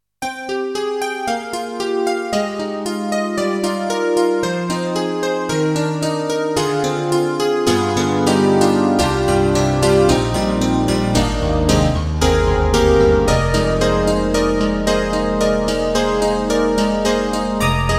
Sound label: KORG N1R MagicPiano